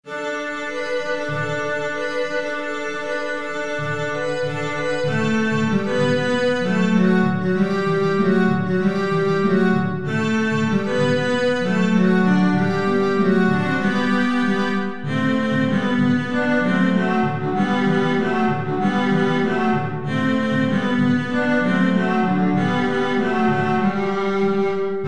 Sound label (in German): streichquintett